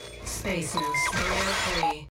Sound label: Radio Electro Jingle; Gangstar Miami Vindication; android; macos